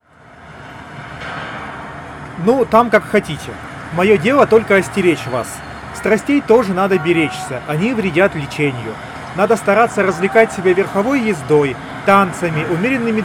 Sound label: Recorder noise; felfri bts-012